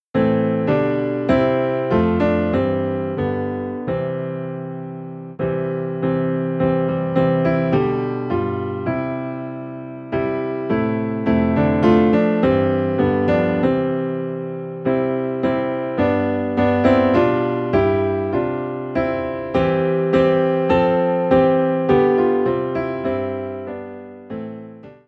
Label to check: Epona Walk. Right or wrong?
Wrong